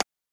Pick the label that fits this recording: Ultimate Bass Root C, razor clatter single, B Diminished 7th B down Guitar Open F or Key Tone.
Key Tone